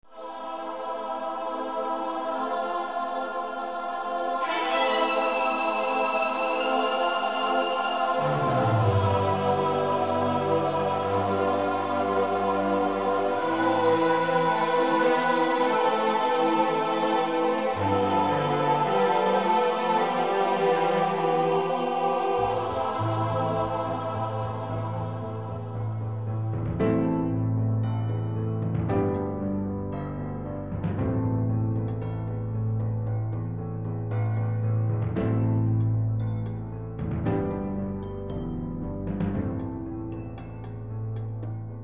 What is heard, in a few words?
race; Galactic Civilizations II